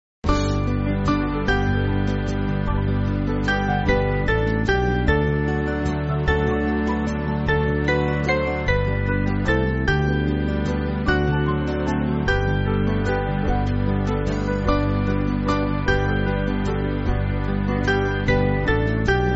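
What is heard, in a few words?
Band, Backing Files for Churches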